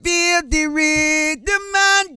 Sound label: REGGAE, Vocal Planet World Voices